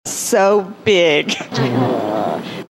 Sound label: dancing Fart